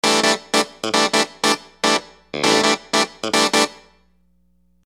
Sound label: Phunky Clav; Construction Kit; HIP-HOP; Sonic Foundry (Sony Creative Software)